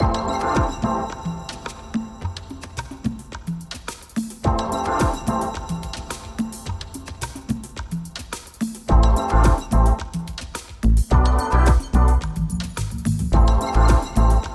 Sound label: Juice Loop; mokoko x